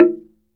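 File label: Slit Drums, hard; Roland LCDP11 Africa VOL-1; Wood & Metal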